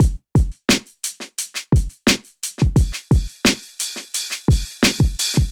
MPE Turn Swing Kit 87 bpm, hip-hop drums; Golden Era; MIDI Clips; Sound Oracle

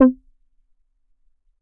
Melodic Pluck; Synth Keys; Ableton; Creative Extensions; Poli

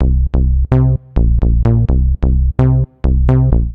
Bass (C) 128bpm; Music Loops; Ableton; Singularities

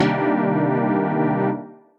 Deepstab, Synth Lead; Ableton; Skitter and Step

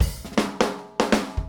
160 Country Shuffle Drums; Pop Rock

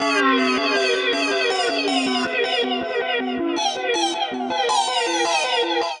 C# Minor 80 bpm, Voice Box; Chromatic; Transformed

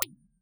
Bubbly Freq Flange; Convolution; IR Samples; Kontakt 5; Unsusual Reverbs

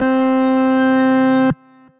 Monster Klav, Synth Keys

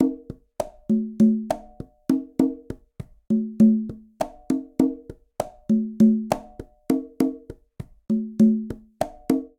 Bossa Nova Conga Tumba 100bpm; Latin Percussion; Ableton; Factory Packs

